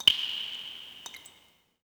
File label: drip; Stake Fortune Fighters; XBOX